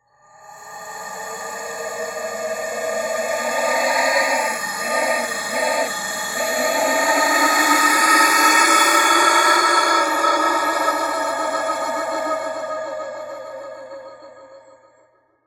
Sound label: Andean Wind Flute; Sonic Foundry (Sony Creative Software); Continental Drift Aftershocks